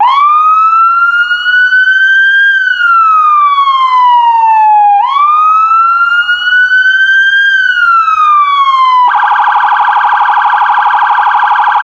Police car

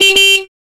Hooter

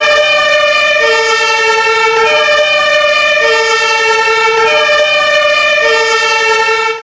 Fire engine

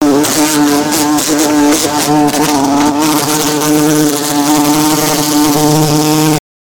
Angry hornet

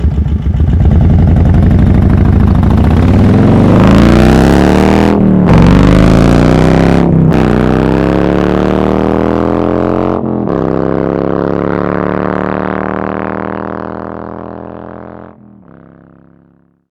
Motorbike

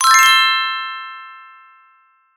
Magic spell

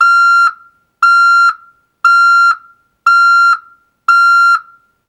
Reversing Truck

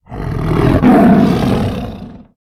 Lion

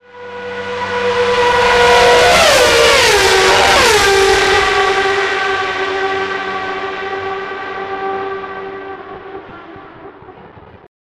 Racing cars

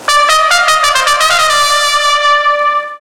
Ole trumpet

